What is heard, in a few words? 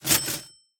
Pickup Cash